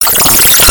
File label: scrambled